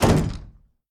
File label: ambient soundscape underground